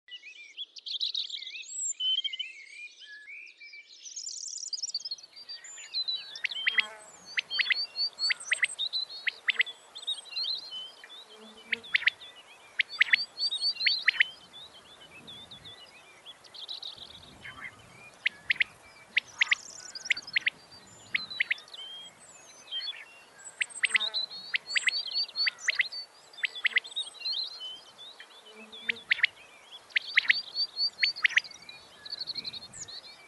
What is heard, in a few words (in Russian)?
Tselebnoe penie ptits